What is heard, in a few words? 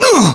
pain, english